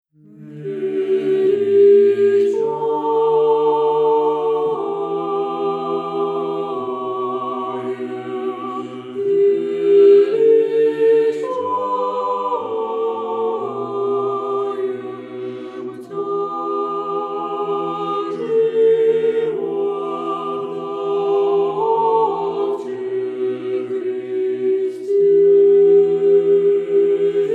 Soprano Velichanie; Sretenie Gospodne

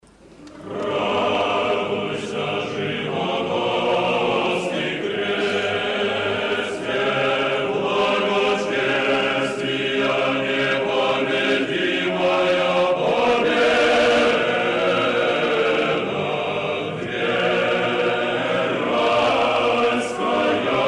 Hor Vozdvizhenie Chestnago i Zhivotvoryaschago Kresta; Moskovskogo Sretenskogo monastyrya

